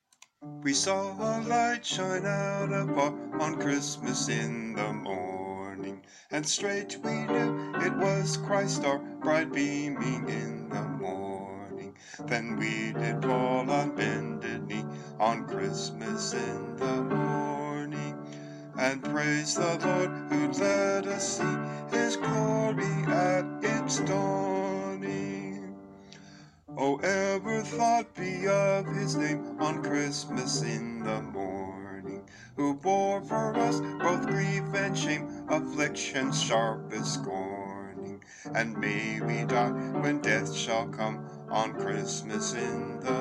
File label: PIANO VOICE